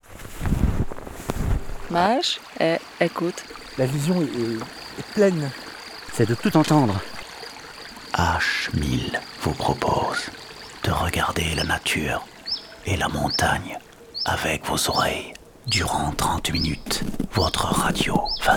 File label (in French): La prairie des sonnailles et le chant des insectes